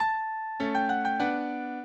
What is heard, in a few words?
minuet, mozart